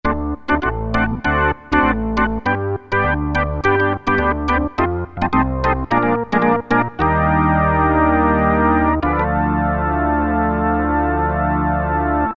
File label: Organ, Roland MKS-50; Factory examples